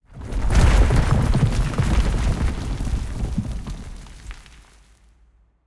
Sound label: cave collapse